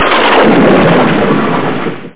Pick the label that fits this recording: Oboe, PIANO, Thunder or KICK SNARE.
Thunder